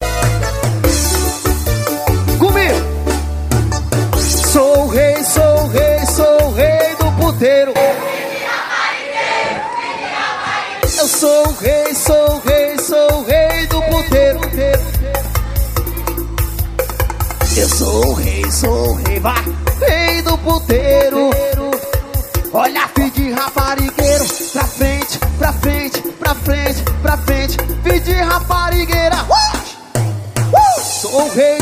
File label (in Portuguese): swing